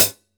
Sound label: HH CL, Drum 1 Dry; East Collexion